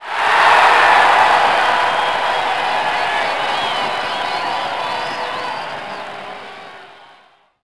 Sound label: cheer, crowd; Splashdown; XBOX